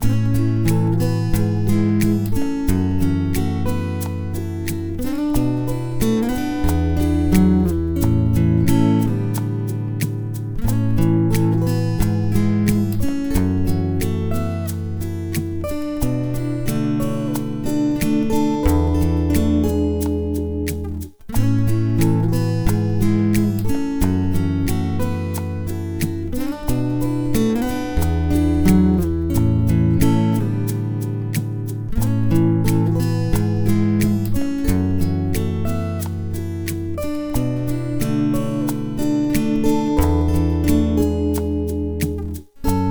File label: menu theme